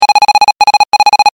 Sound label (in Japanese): 文字送り 長